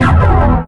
spawn, miniboss kamikaze; zombie riot

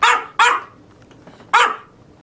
bark win; freak fortress 2; buff doge

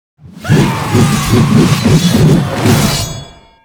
gorefiend attack; kf2; slender

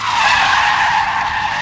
kart brake; tf2ware ultimate; mariokart

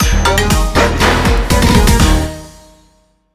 game over; tf2ware ultimate; music game; switch moveit wario

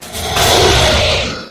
stun; slender

